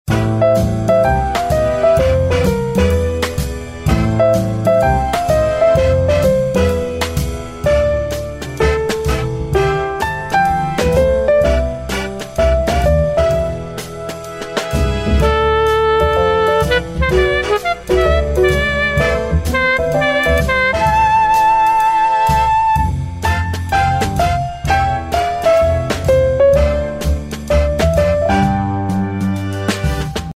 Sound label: bgm